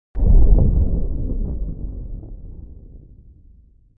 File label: Bass impact - Super explosion; Hollywood Edge Sound Designers Tool Kit